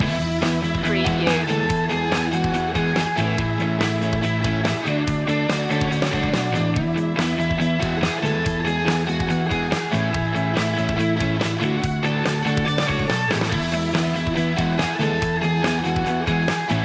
celebrate loop

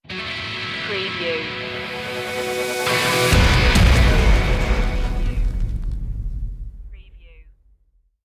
thunderbolt sting

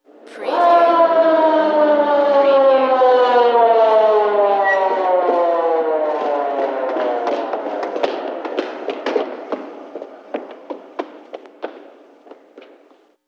Police Siren Stop Run

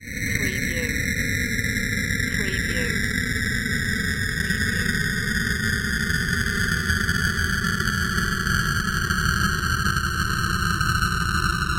Space Ship Landing

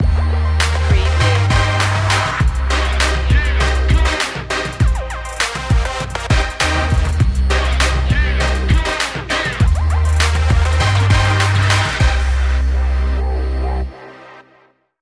tough game

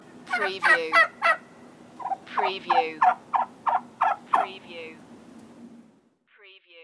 Turkey

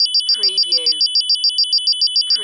Alarm Beeps Loop; sound effects bible

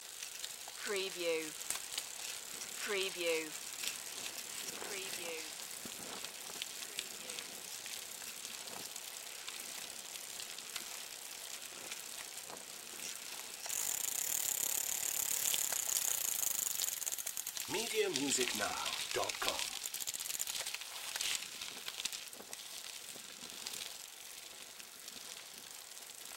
Bicycling on pavement or road

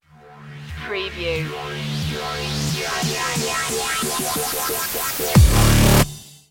droid shuffle stinger

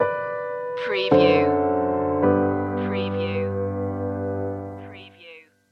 our last night stinger

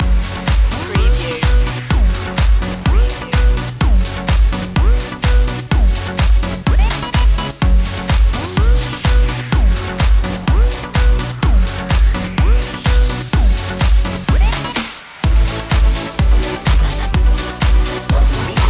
rhythm changer loop

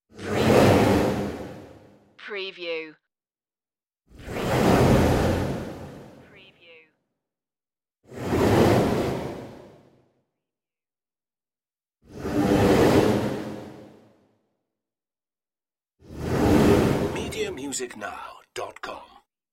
windy hollow whooshes

